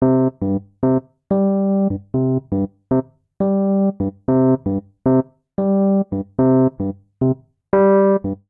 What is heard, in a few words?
Ostinato Latin Simple C Major 113 bpm, Electric Keyboards; MIDI Clips; Ableton; Factory Packs